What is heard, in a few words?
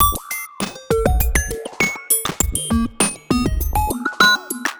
Robo Beat 100BPM, sci-fi Beats